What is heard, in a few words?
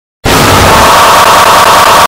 scream